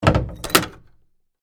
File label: Cabinet Door Close, Pad, Lock, Latch; BlastWave